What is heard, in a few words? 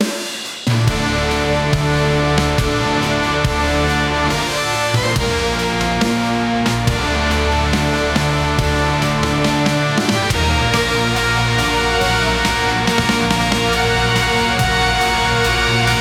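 epic superhero entry music; heroic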